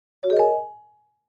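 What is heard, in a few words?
Clavichord Short Arpeggio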